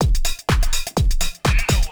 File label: DRUMLOOP HOUSE 125; eJay